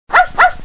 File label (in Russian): Gav Gav